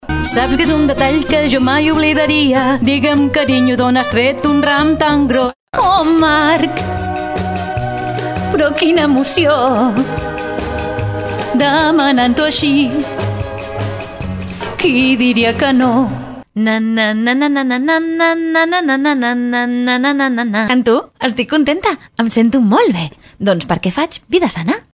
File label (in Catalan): falques cantades